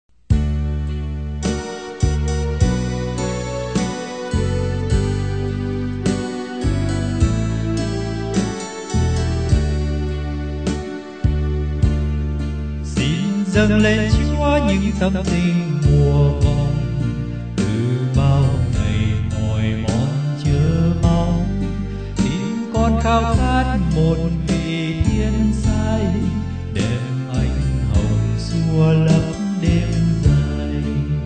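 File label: soprano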